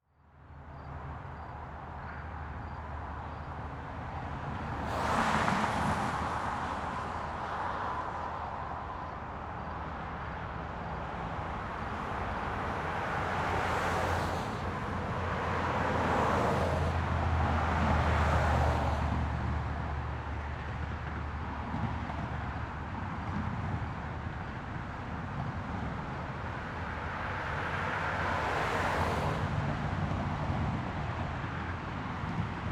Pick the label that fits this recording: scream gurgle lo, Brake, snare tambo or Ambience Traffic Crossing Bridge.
Ambience Traffic Crossing Bridge